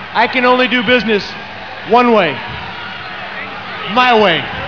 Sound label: sting